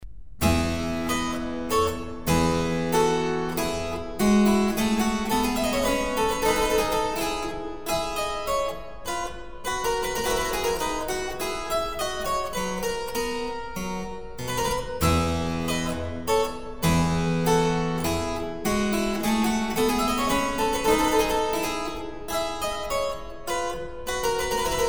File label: Minuet in F KV1d; Mozart